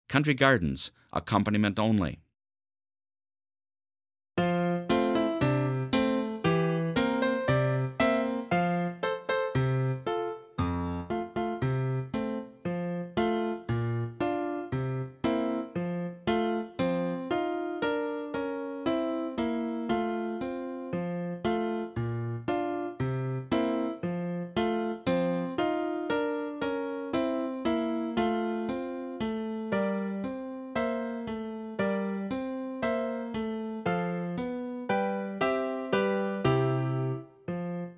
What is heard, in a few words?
French Horn Acc ONLY; Country Gardens